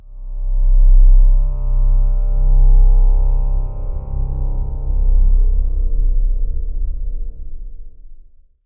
amb organ; gamerip; blackfaun

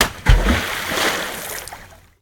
boulder splash

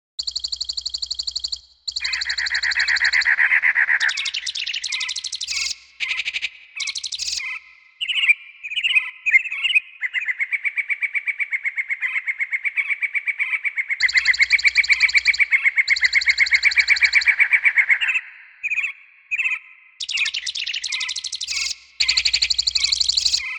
Island SFX; Diddy Kong Racing HD; N64

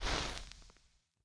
Light Off

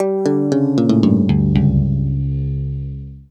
Tombstone Melody; Haven Forest

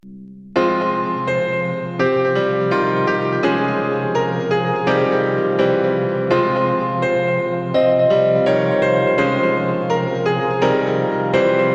Battle Snow Forest

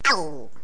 auw; Raft Wars

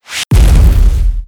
Summon Meteor

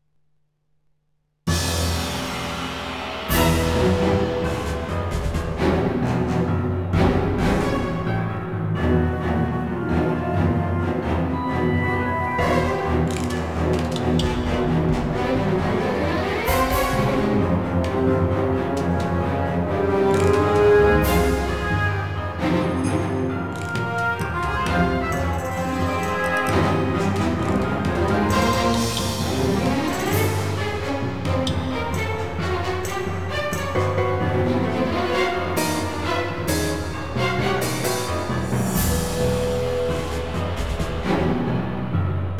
Swim Danger; Sphinx and the Cursed Mummy

